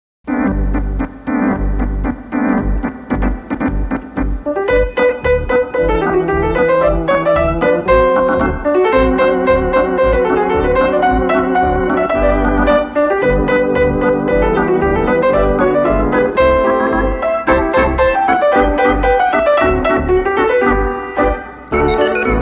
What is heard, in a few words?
loomis lancers part 3 (instrumental)